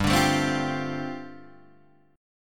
G 7th Suspended 2nd Suspended 4th, G, Guitar, Open D